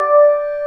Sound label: combo power; tetrio